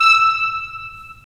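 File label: WND CL, wind clarinet; LA Composer vol. 3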